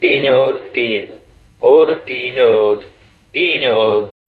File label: jingle